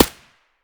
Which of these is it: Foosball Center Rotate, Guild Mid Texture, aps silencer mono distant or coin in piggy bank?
aps silencer mono distant